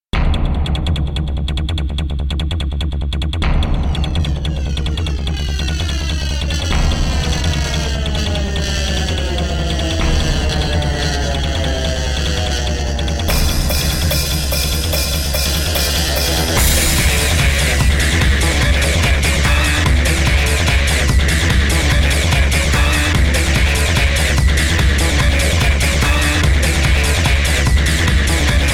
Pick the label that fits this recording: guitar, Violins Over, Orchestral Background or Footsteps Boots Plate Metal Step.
guitar